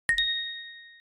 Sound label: Rain, phonetones notifications; LEAGOO; M9